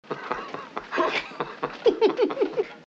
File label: laughter